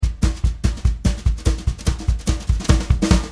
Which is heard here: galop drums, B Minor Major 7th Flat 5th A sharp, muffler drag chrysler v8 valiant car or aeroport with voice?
galop drums